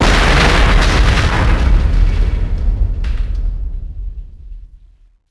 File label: radio mast crash down; Max Payne; penthouse